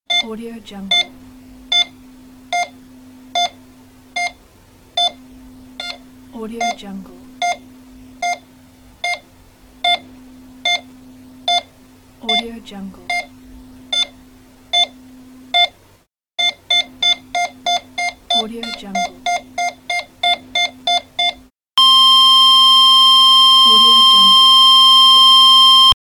hospital heart monitor

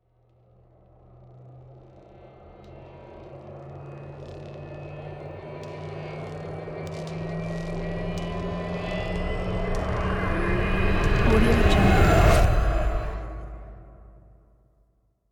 horror tension thriller

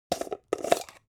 object jar plastic midsize open short

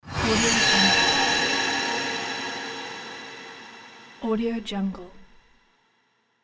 holy light spell buff; jbobstudio

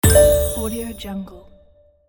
china select button

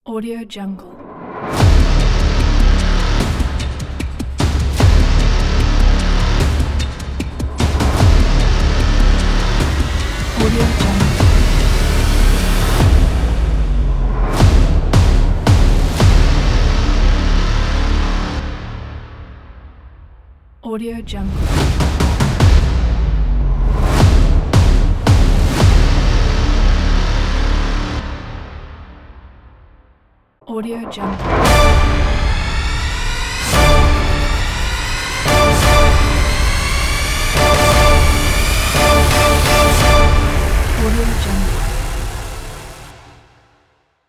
epic logo pack

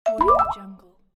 pops notification

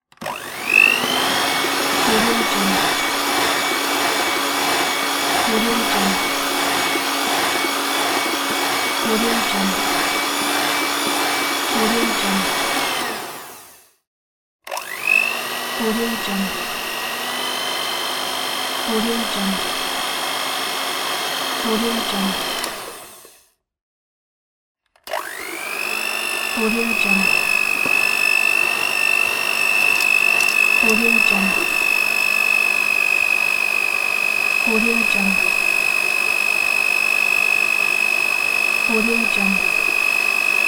mixer

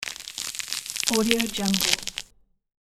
bone twist; hollywoodedge

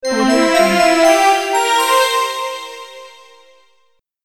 award received

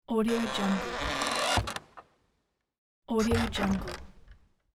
wooden creaky door opening and closing

